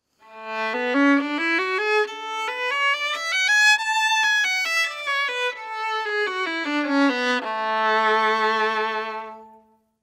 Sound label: violin scale